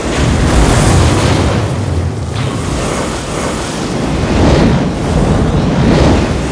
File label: flame run, flamethrower; weapons